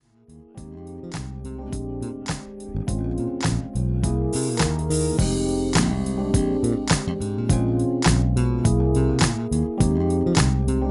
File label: Chill Relax Mix